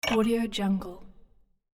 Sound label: porcelain cup; cinematic sound design